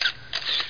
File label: shutter